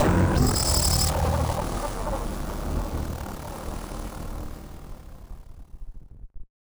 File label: Malfunction Synth, malfunctioning synth samples